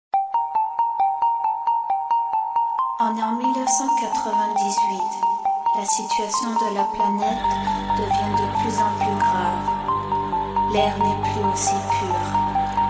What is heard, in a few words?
electro deep